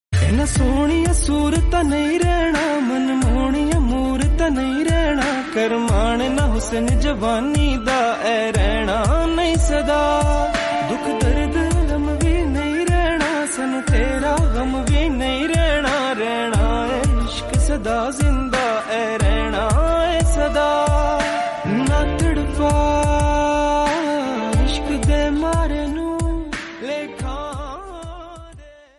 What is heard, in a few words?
Sad Ringtone